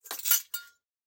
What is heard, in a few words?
Glass Debris; SoundsCrate